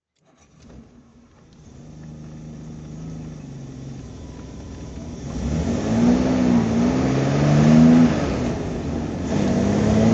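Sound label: jag xk150s rally drive, Engine sound driving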